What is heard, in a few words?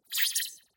Anime Punch Synth Swirl